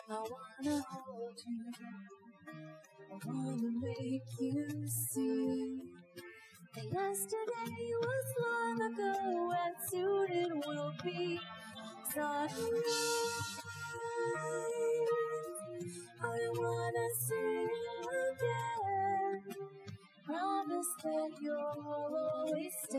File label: vocals; SiSEC11